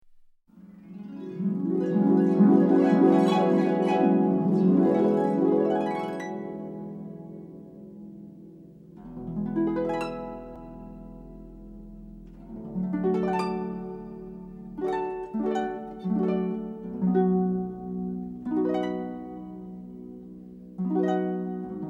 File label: Harp; Doodles